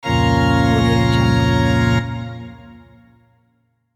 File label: halloween organ